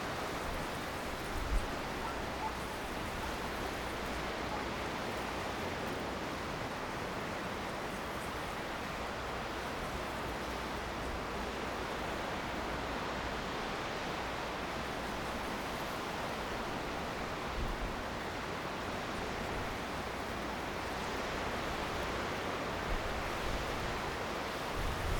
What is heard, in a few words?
Wind Blows Through Marram Grass